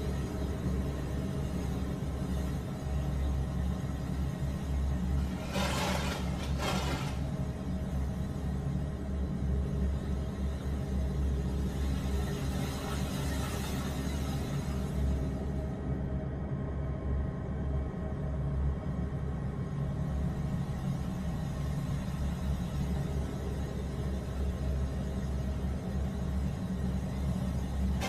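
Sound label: ambience interior building; Titanfall 2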